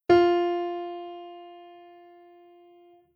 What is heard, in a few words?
alto low scale